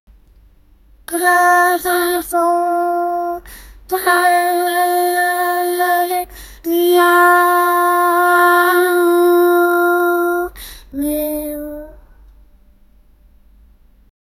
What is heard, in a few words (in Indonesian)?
suara kucing tidur; domestic animal; domestic animals